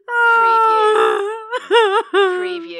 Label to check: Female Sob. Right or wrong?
Right